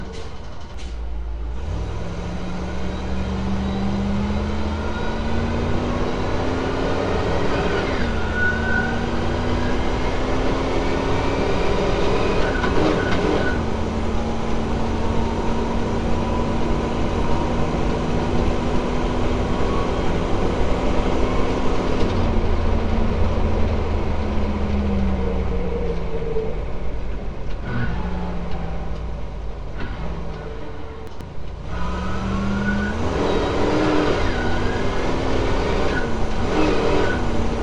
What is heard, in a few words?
AT540 Herky Jerky